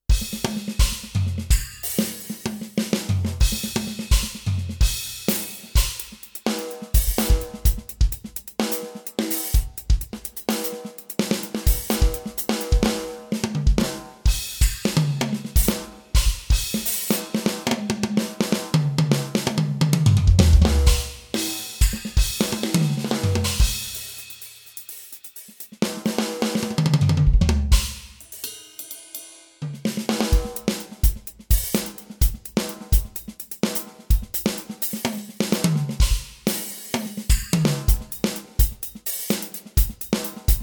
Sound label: drums